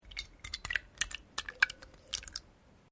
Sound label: Glass Bowl Filling Liquid Food Stuff; Kitchen glasswear; Materials